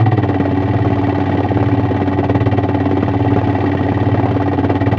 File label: space engine small; kenney; sci-fi sounds